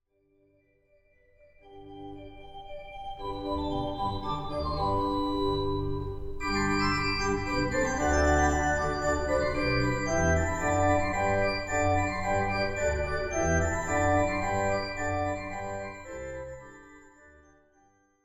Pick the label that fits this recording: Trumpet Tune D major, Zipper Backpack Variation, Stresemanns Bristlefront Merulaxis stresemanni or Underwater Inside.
Trumpet Tune D major